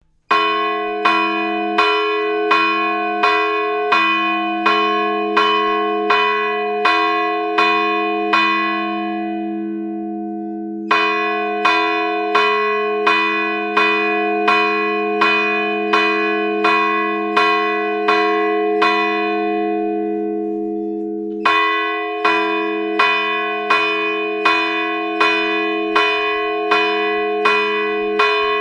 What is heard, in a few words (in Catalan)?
el segon, toc, campana gran, Sequència: 3 vegades 12 tocs i per acabar 2 tocs secs; Es tocava els festius, des del cor; campanar; Josepa – Francesca